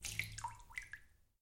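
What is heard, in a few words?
water drop high in space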